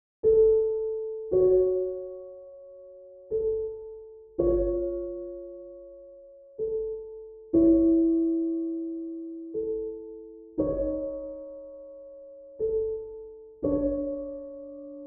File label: Piano Room; QUANTEC